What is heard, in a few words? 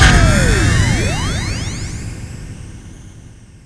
Run